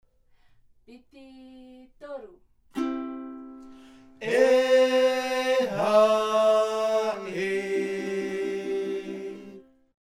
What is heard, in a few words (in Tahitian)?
himene ute; He ha he noa